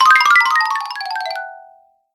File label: funny cartoon sound effects cartoon failure piano sound effect